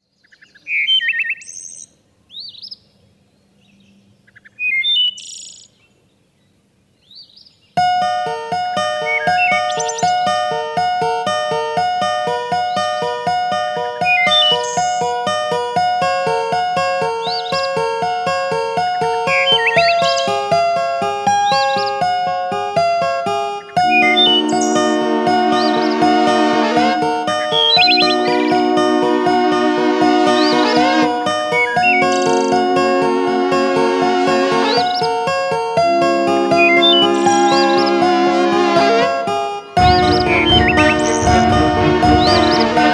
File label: epic 432 hz